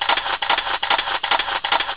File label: cameraclick